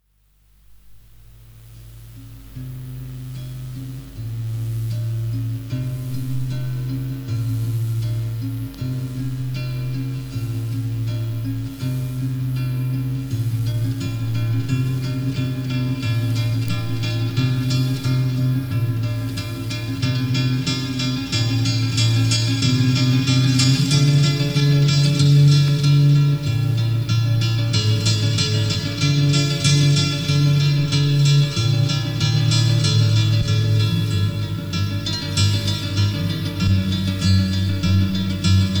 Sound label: funeral favete linguis instrumental; 1993